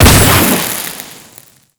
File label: Auto Crossbow Charged Shot; Warframe; Weapons; Tenno